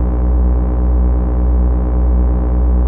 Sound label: hologram; The Swapper